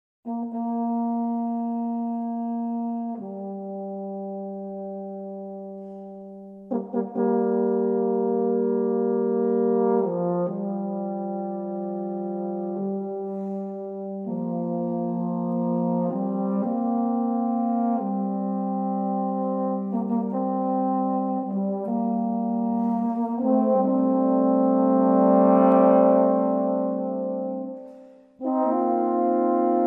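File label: Fanfare, Low Brass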